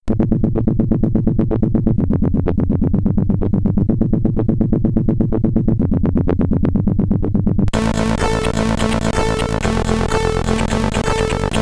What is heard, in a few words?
SID Stereo; katakis; commodore 64; Stages 3,7, and 11 (Alisand)